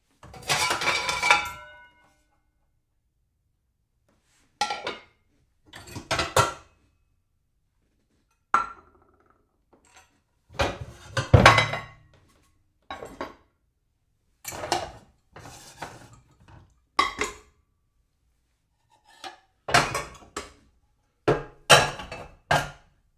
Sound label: dishes shuffling